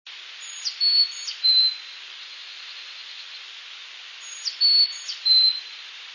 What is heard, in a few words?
chick carolina, chickadee carolina